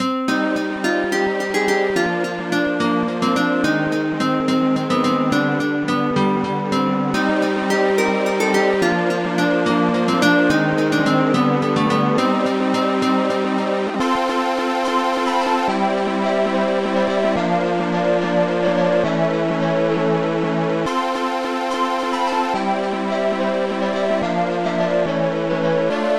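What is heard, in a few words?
grenade; Gold Medal Software - Volume 5; music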